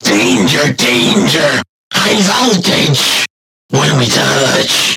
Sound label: Game Over